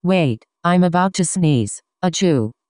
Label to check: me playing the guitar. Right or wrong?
Wrong